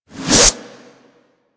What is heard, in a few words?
Boomerang Fold; Warframe; Weapons